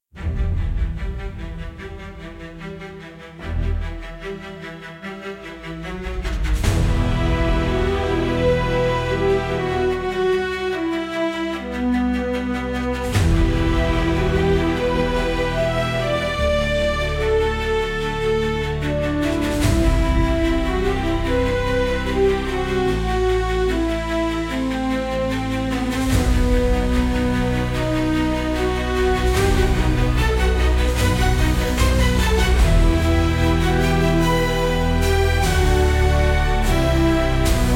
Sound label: progressive rock